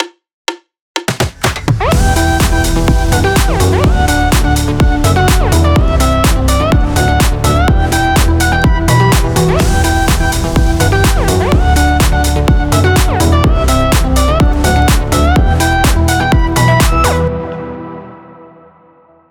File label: deephouse